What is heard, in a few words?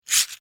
Servo Mechanical SciFi Robot Toy, Scifi Servo C Mechanical Robot Small Motor Toy; BBFX